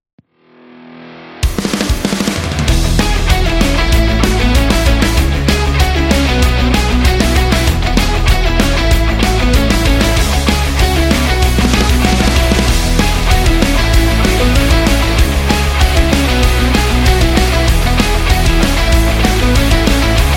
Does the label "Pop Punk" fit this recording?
Yes